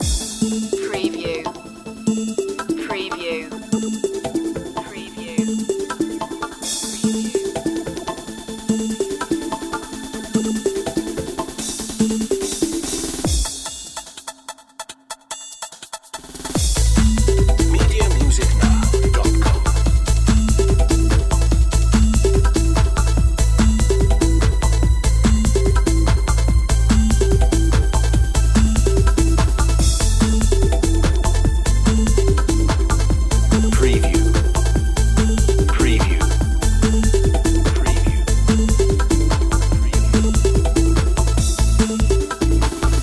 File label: electromanics loop